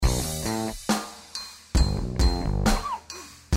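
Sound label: drum n bass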